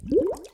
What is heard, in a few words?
bubble